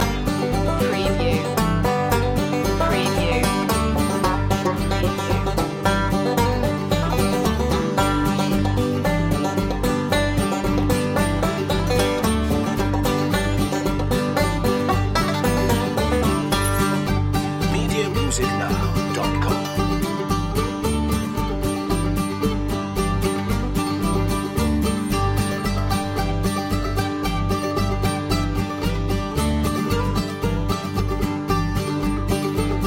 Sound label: back porch stomp loop